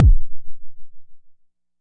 Jomox MBase, drum machine; Jomox MBase 01

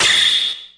whistle noise hit; Weird and Interesting Hits; single hits

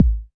Pearl SC-40 Kicks

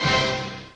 HIT ORCH, Kawai R50; DRUM MACHINES

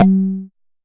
Jomox MBrane, drum machines; Jomox MBrane 11

